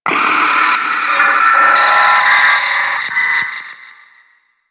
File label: Eye Pain Cry; Ambience; Orokin; Warframe; Orokin Moon